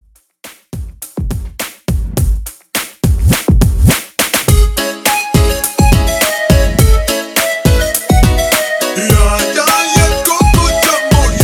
90s Disco Party Dance 104 BPM

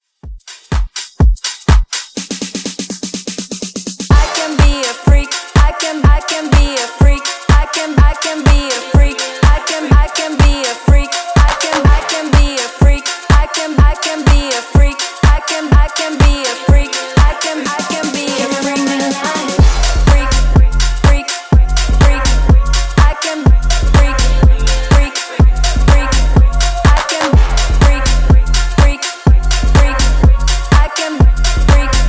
Funk Intro Outro 124 Bpm

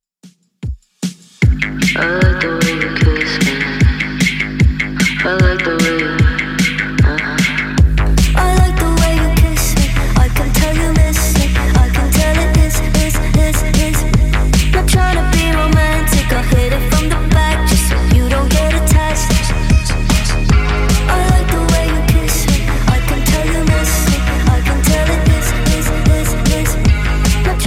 Top40 Redrum 151bpm

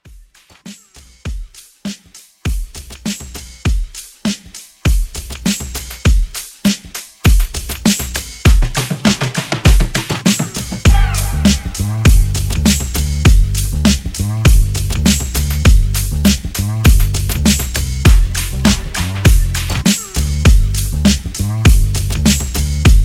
Club Edit 100

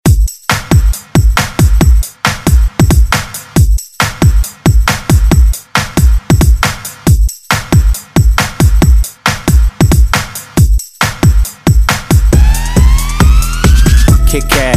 Top40; Redrum Dirty

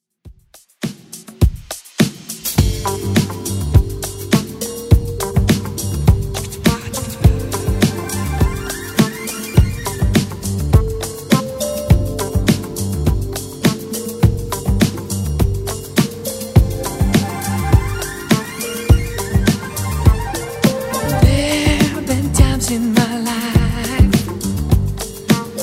70s 103bpm

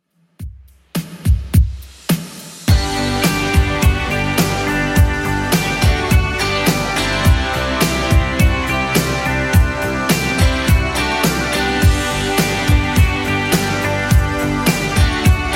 80s Club 105bpm CLEAN

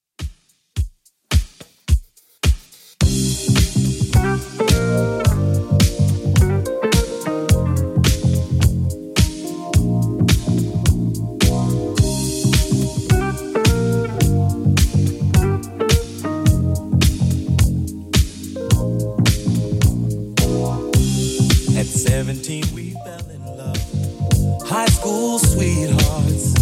70s Redrum 107bpm

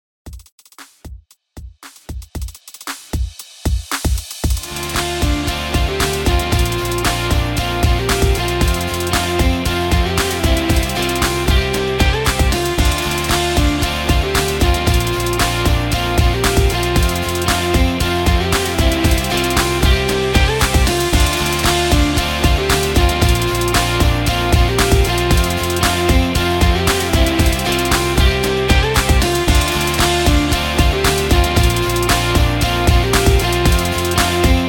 Redrum 115 BPM; EXTENDED

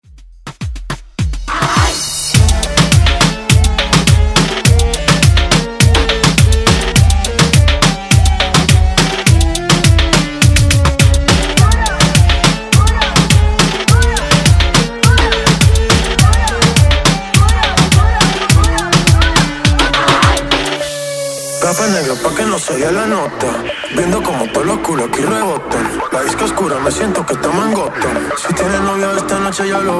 Moombahton Redrum

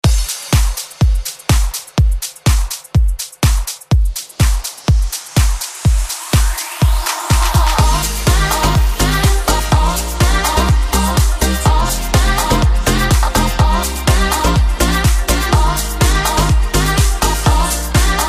future house redrum 124 bpm

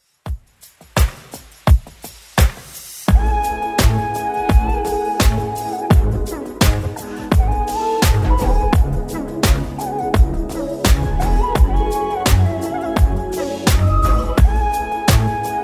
Top 40 Ext Redrum Uptempo 85 bpm CLEAN

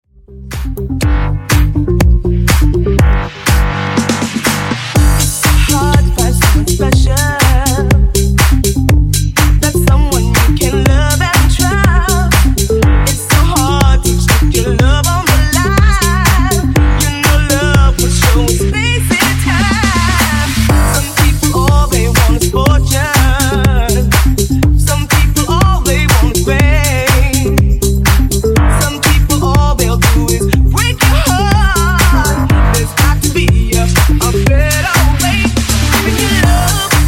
Funk Redrum 122Bpm

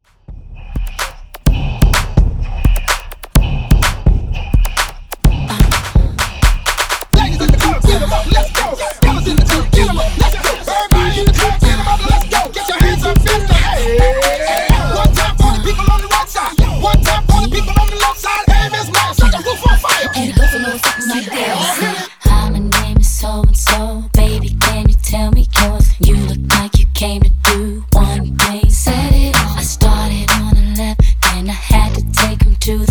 Hype Re Drum 127 Bpm

